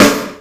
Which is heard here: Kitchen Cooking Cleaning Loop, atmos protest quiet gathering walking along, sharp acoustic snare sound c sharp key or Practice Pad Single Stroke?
sharp acoustic snare sound c sharp key